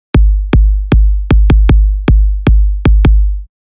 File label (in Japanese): 4つ打ちドラムのサンプル（ストレート）; EDM; ストレートなドッドッドッドドのパターンです。